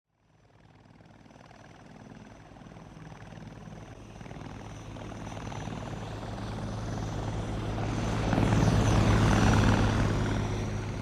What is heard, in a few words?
Military helicopter flying overhead aircraft pass by